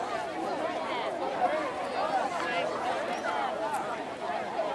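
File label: Boxing Crowd Ambience Loop; Crowds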